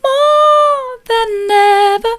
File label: soft, vocal